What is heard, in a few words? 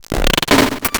grind, EMU XL-7